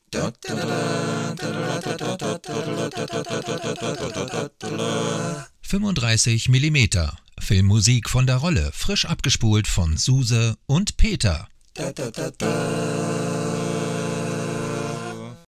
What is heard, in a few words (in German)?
Jingle, Der Filmmusik Podcast; 35mm; Filmmusik von der Rolle